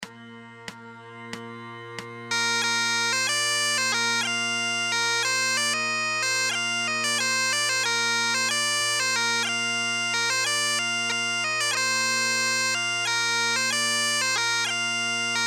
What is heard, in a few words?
accomp